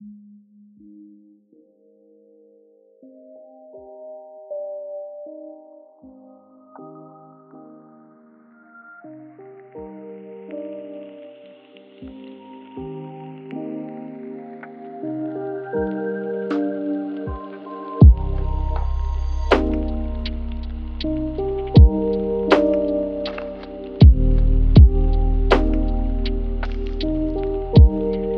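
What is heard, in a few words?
Chill Beats, lofi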